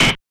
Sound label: Yamaha DJX 2B, SD Hard